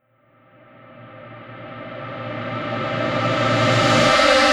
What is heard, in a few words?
reverse, vocal sample